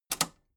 metal button robust spring release disable

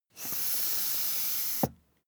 air pump ball hard

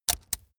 plastic stamp

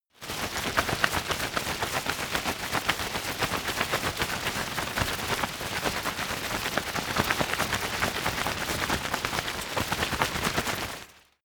umbrella big shake rustle hard

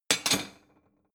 plate small shelve slide hard

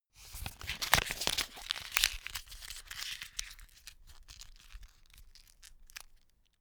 notepad sheet crumple

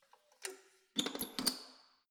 letterbox metal push close lock

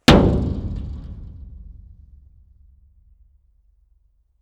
metal impact tank hard

